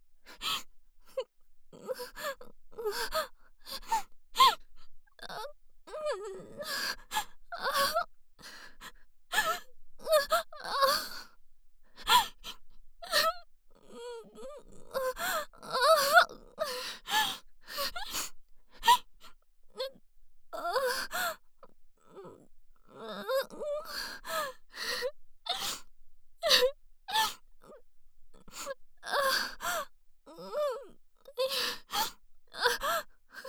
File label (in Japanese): 喘ぎ声 凌辱系